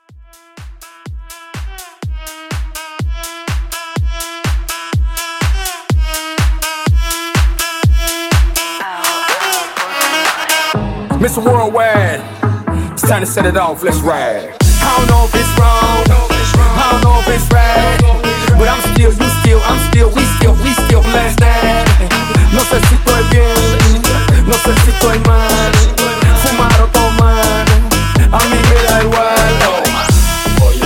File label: Hybrid Mashup